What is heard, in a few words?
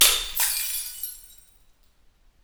Flourescent light breaking; SFX; IRL Recorded